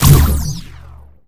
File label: laser hit Enemy; Space Wave Race